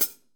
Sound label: HH, drums; DRY KIT; Real Mega Drums